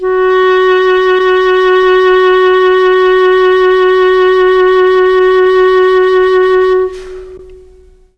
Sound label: CLARINET F#3, Mellotron; Mike Pinder - Mellotron Archive CD; CHM CLARINET